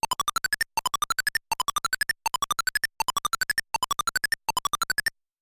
Fuel Bonus Sound

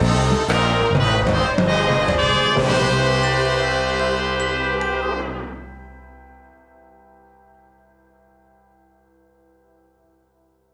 Crimewave Complete Sting; lego the incredibles 2018 switch

